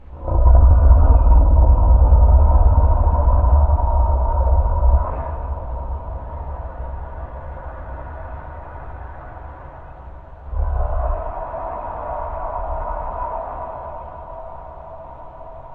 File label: suspense; DayZ-Epoch; dayz sfx